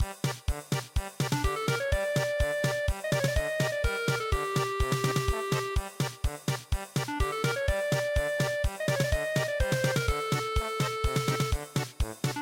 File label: polka; Canto del carcerato